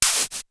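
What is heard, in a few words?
Klone Dual Percussion Synthesiser noise mod; KLONE FX NW8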